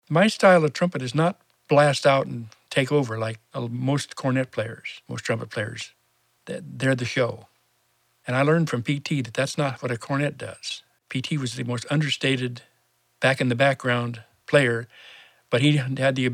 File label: Horn style goose